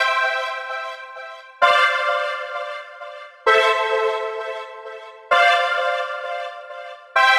80s Flav Bras B, electric jazz

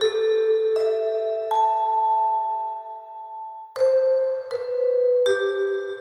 Vibes, сэмпл вибрафона; movie score

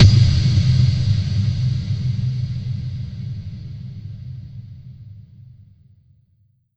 Hit, техно-транс; Звуковой эффект в стиле Техно-Транс: Hit H; Techno Trance; FX; звуковые эффекты

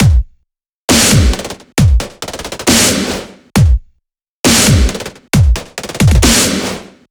Smash Kit; Барабаны

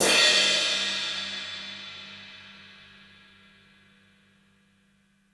Jazzy F; Сэмплы ударных (Легкая музыка); 90BPM; Beat; Drums; Easy Listening; loop; Ударные

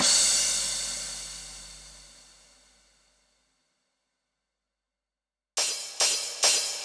Crashes

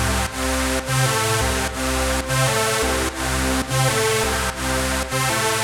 Bumtschak Lead, 170 bpm

